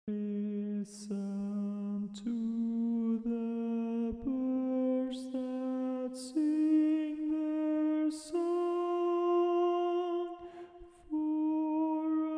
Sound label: Springtime, Tenor, Barbershop